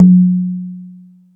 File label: Jungel Percussion, E-MU Pro-Cussion